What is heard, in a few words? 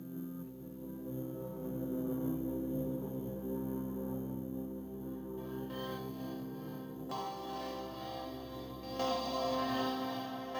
whale drone